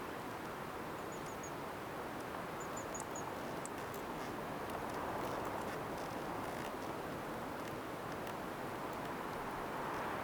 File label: cactus desert loop, ambiences; michael ghelfi